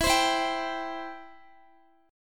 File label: E Minor Sharp 5th E down Guitar; strummed; 4F,4A,5C,5G,5C,6E